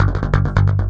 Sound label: fast rolling bass